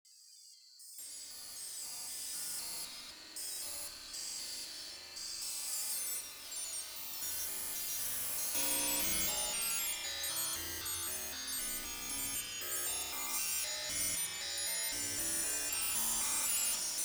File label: bug noise; musicbox